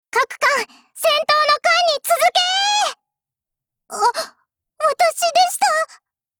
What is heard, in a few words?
battle warcry